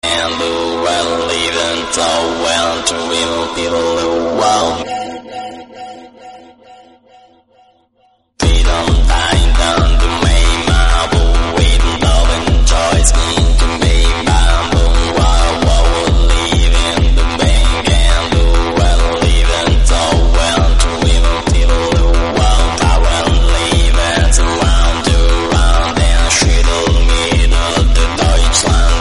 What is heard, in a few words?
german techno; ringtone